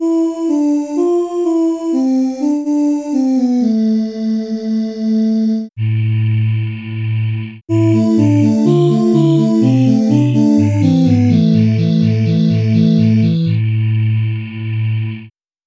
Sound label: space voice